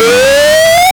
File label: damage